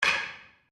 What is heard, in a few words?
Wood On Wood; Material components for constructing more complex sounds; Materials; Foundation4